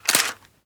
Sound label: bolt back; Rust